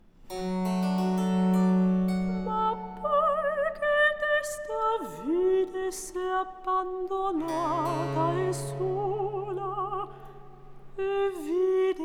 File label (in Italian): Recitativo; Arianna; L'Arianna (Ebra d'amor fuggia), H. 242; VI. Recitativo Ma poi che desta vide